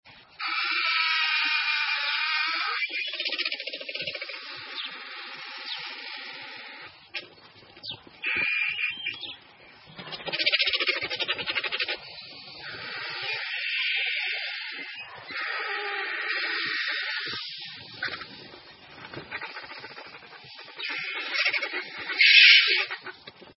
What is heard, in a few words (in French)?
vautour africain, chant